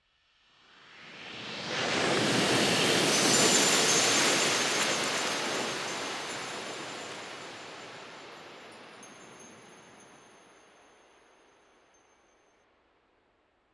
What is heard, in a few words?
Up Down White Noise Rev Cymbal Chimes and Waves